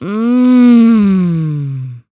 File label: Human Female Mmm